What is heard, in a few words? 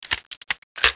SRELOAD; OutLaws